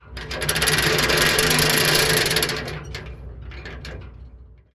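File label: anchor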